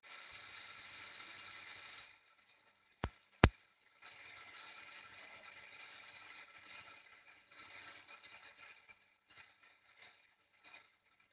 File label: water